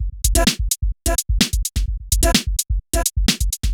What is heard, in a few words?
Electro Loop 128BPM; Vengeance Samples